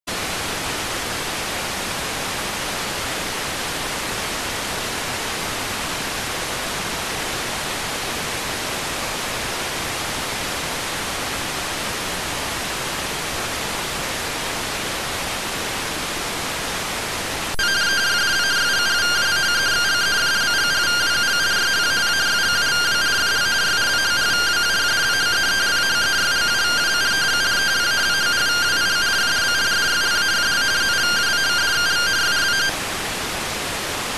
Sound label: rtty rx